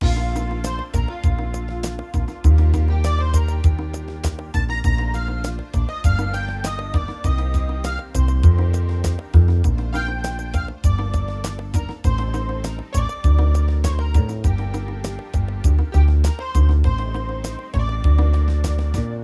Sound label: midi